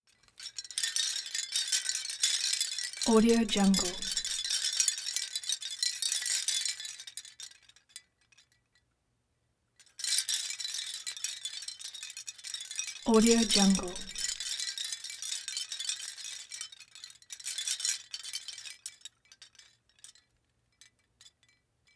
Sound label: shell wind chimes scallop shell type start